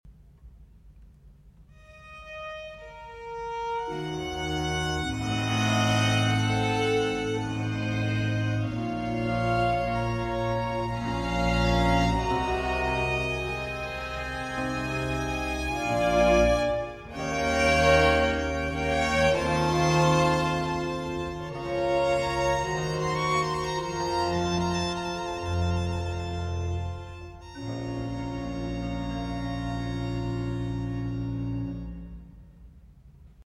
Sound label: Concerto No. 4 in E minor for 4 Violin